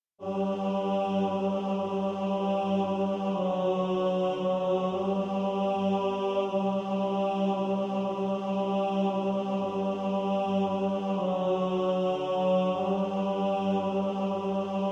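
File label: tenor, practice music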